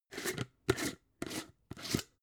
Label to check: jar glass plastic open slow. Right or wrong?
Right